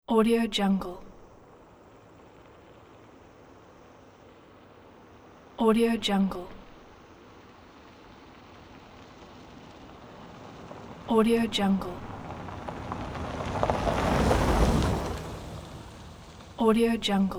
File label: car pass by